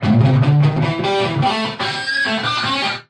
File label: straight up guitar lick